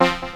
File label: HIT, STABS, House